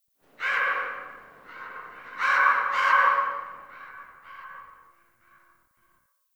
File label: Tree Distant Critters Scared; Warframe; Duviri; Ambience